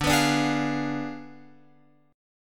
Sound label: D Diminished 7th D, Guitar, Open F; strummed